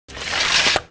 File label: sabre; Pirates Of The Caribbean; DUEL